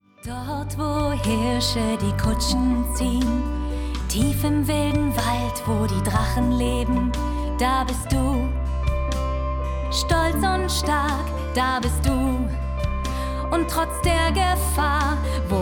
Prinzessinnen Lied

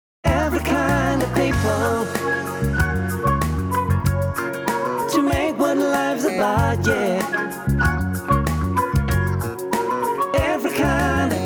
Gesang Englisch Pop; LOFT VOICES

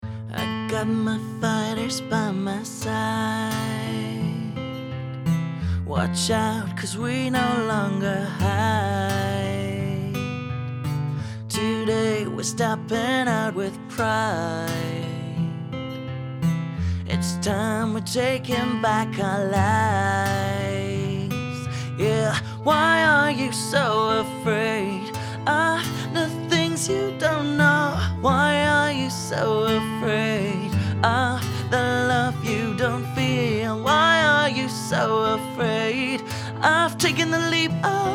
gesang; LOFT VOICES

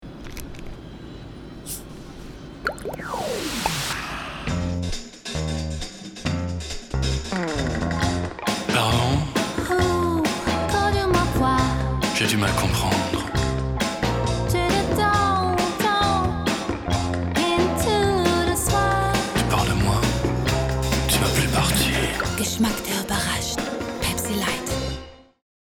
Gesang

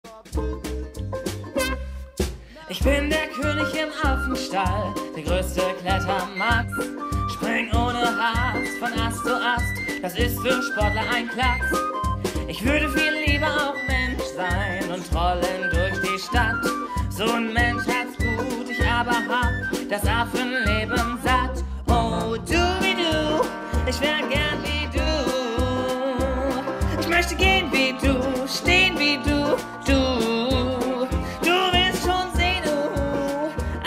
gesang

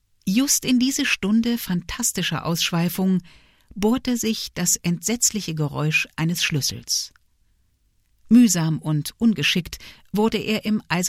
bach an der orgel

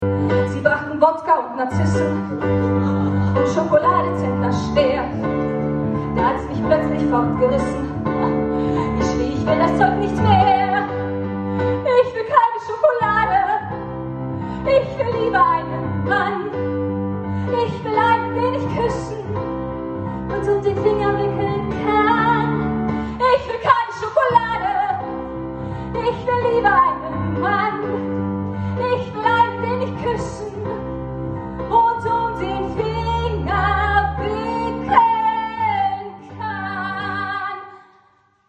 Gesang

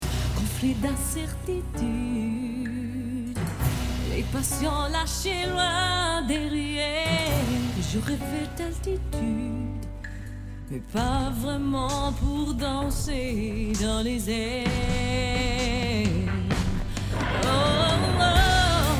pop gesang; LOFT VOICES

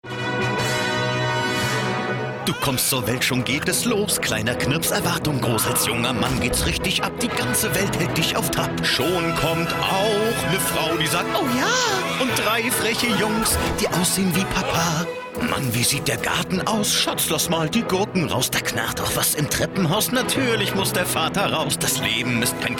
gesang

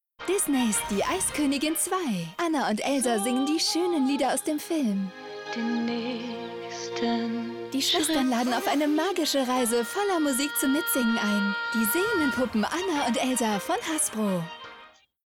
TV Spot singende Puppen; Hasbro

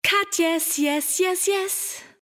sprecherdemo werbung gesang; katjes

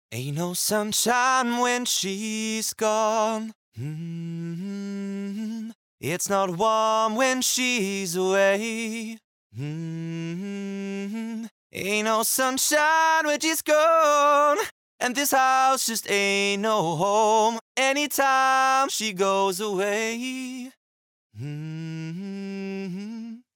gesang; LOFT VOICES

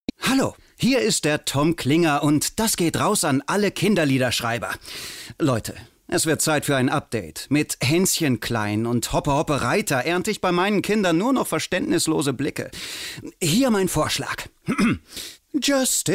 kinderlieder; rediozentrale

